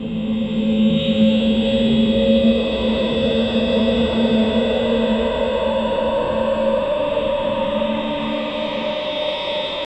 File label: dark ambient music with, metallic scraping